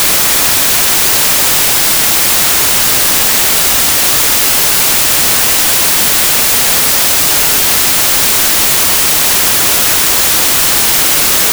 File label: white, White Modulated